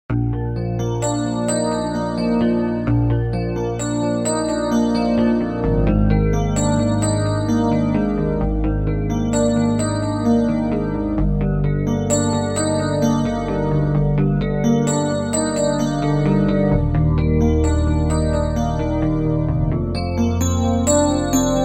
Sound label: Tingly E Piano